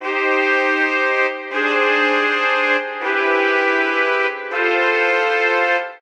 Trumpets

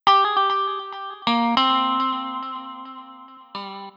Clean Solo; лупы

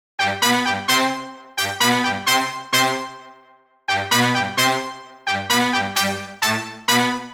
Dool, latin

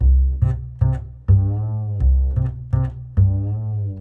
Nervous B, jazz

